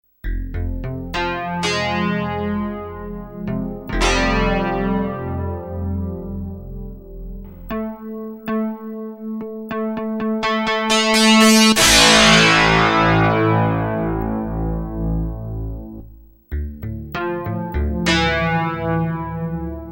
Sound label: SY guitar